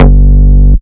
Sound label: Bass Shot G; Mutekki Media; Vengeance Electroshock Vol.2; Bass Shots